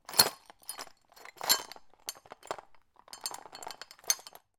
foley glass broken bits movement in plastic dustpan; zapsplat